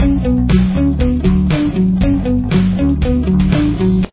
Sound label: intro main, the guitar part sounds like when played with the bass guitar; drop D tuning